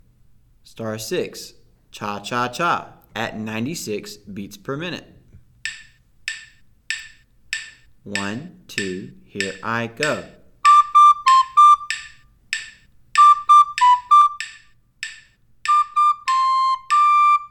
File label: 96 bpm; Cha Cha Cha!; Recorder; Be A Recorder Star Songs